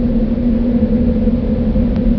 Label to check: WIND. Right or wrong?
Right